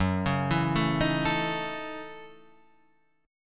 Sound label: F sharp Minor 13th; arpeggiated; JGuitar